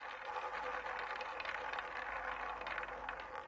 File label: ball spin, European Roulette; Evoplay